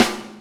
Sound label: brock, snares real